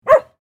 Medium sized dog bark

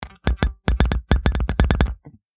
Electric guitar multiple tapping

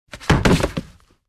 Human body fall on carpet wood floor

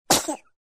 Infant cute sneeze

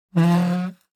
Light furniture sliding on floor moving

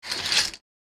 Bed curtain slide squeaky rail open; blinds

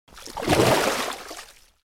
Swimming freestyle single stroke

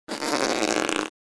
Rubber stretch and stress

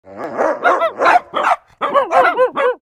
Dogs barking and whining

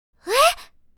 Surprise sigh anime girl vocalization

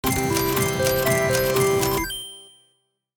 Slot machine casino medium win

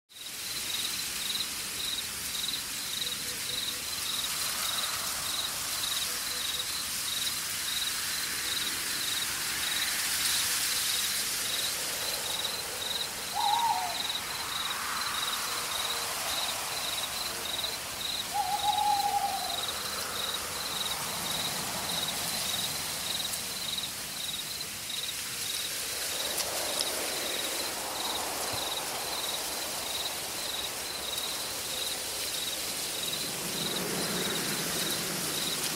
Forest at night wind breeze birds and wildlife

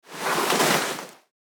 Leather fabric handling

